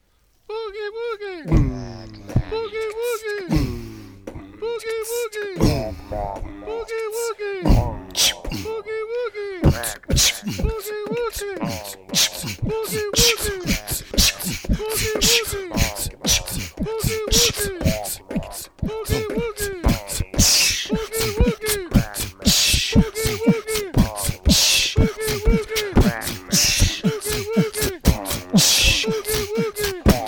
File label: Boogie Woogie